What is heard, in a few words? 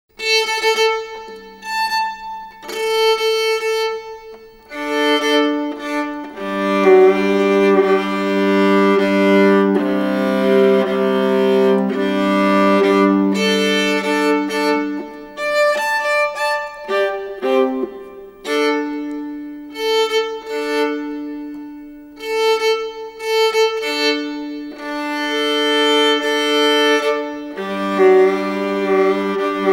viola; evangelion death